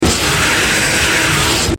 Xscream; The Joy of Creation Halloween

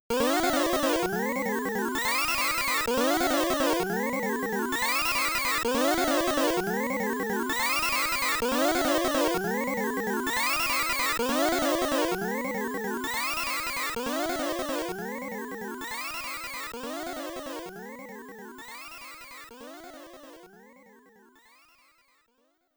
In-Game Ambience; gaplus

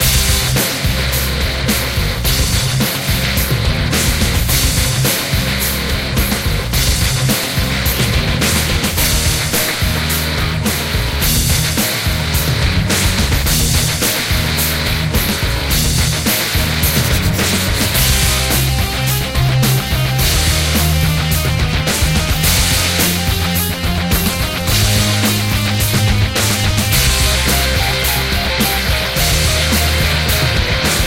Landing Battle; Fishing Strike; Android iOS gamerip 2018

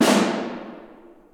Snare

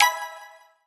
Card Played

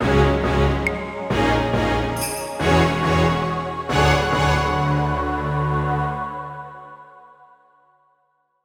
Dungeon Entry; Dungeonmans

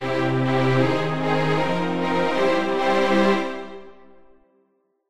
Cinematic Victory Sound; Neon Arena

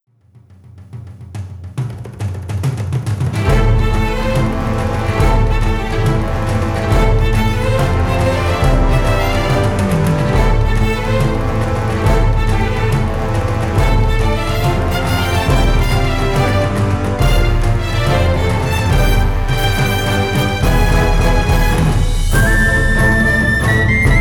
Pirate Travel Music; pirates of the burning sea game soundtrack 2007

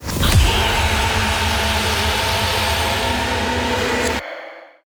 combat surface 186bpm; Doom; GLORY

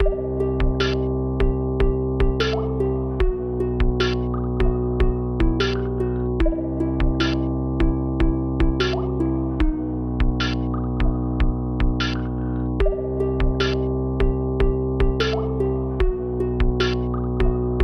Cave Fast; SuperTux Milestone 1

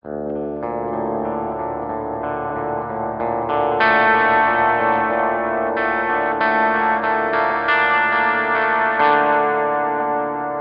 G steel

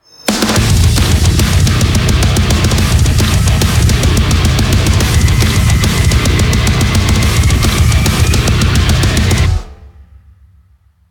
heavy; Doom

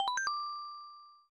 Get Item; Shounin yo Taishi o Idake; SNES

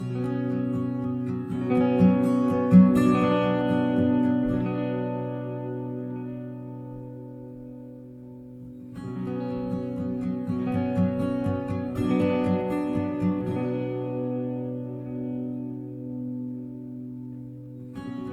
Ambient, Guitar Stem; ps4 ps5 switch windows xbox one xbox series xs

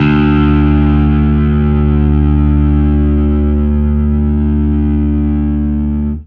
Fuzz D1

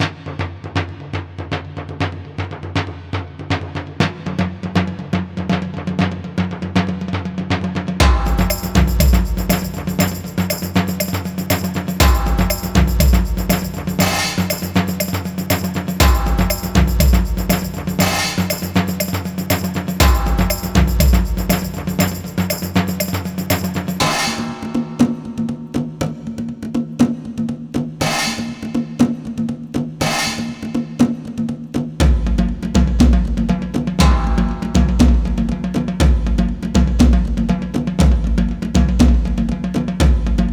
Drum loop; Stronghold Definitive Edition

